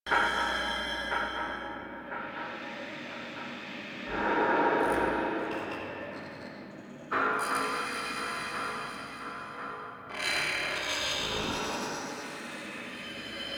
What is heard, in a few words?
Interior Bed Loop Small